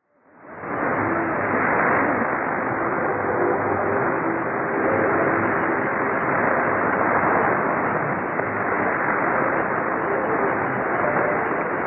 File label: 6220kHz Mystery Radio